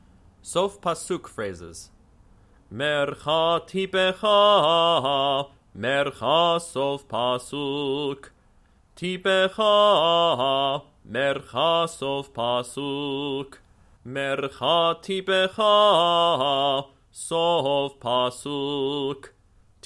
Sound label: Haftarah Trope Sof Pasuk Phrases; Kol Ami — The Northern Virginia Reconstructionist Community